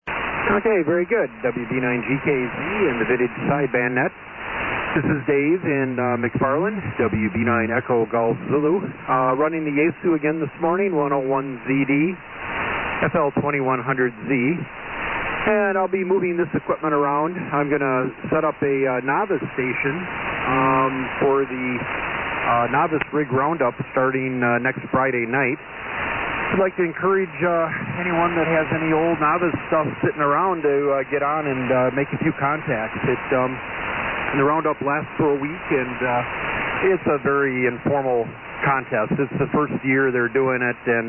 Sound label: Yaesu FT-101ZD & FL2100-Z Amp; McFarland WI.